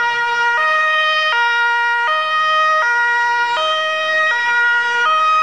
Martinshorn

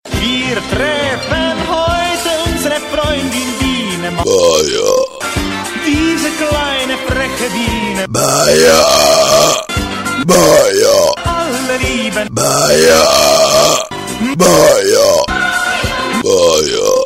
Ruelpsong; Biene Maya

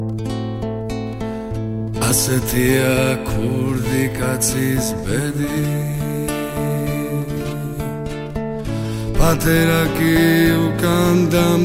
Georgian Musik

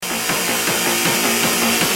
Sms Ton; Murder 2

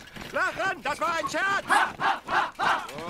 Ausbilder Lachen

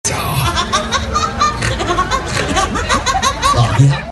Witziges Lachen

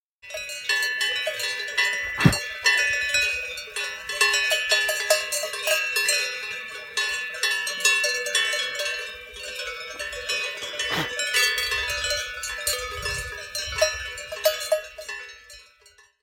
Kuhglocken

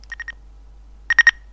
Siemens x45 Ton

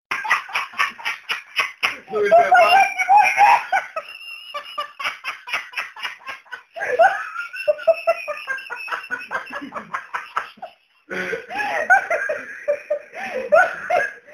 Tante Lacht

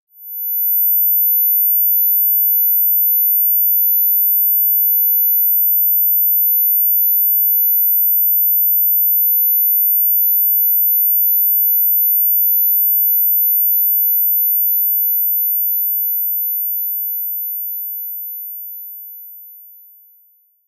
Piep; Virus

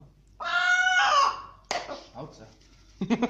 Huhn tot

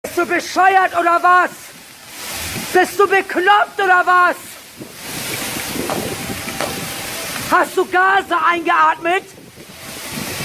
Gas eingeatmet

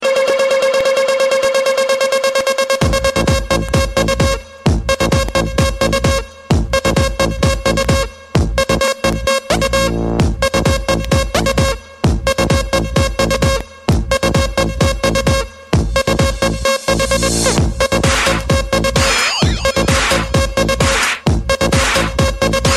Tekno Musik

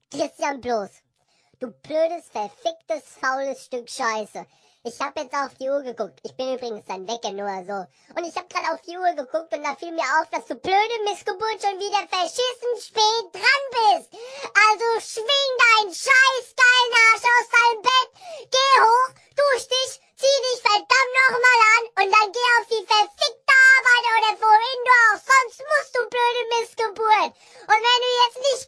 Aggressiver Wecker

